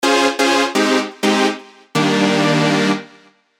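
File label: synth, old skool synth; Pacifico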